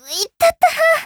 combo break

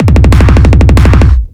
kickdrum

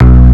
bass; osu

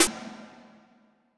snare reverb

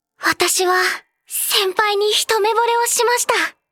applause

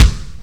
drum hit whistle

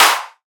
Clap; osu

